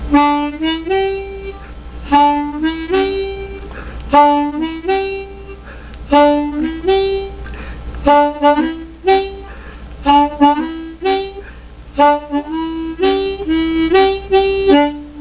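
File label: tune riff, diatonic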